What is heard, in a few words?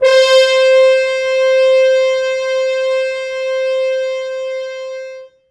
Horns stops